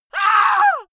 scream hysteric male; vocals; comedy-cartoon; soundpool

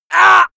male hit

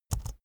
keyboard laptop enter press fast soft

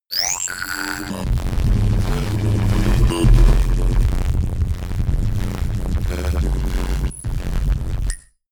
rumble glitch long, sciene fiction

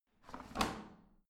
washing machine drawer in; haus garten; haushalt

